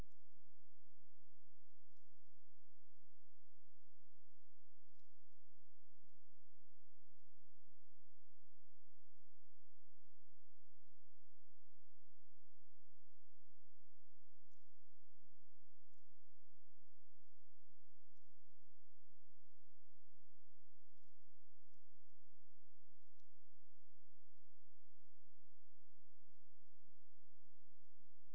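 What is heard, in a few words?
hydrophone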